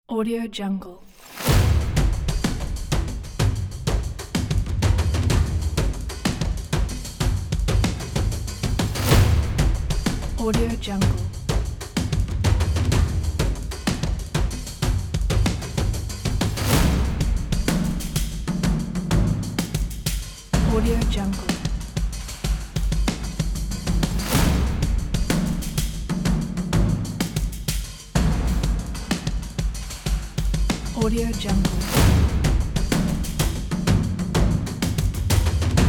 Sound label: the epic industrial percussion; andromedasound